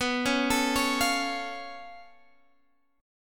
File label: Db Augmented 7th B